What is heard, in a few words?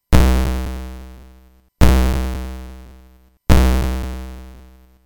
drum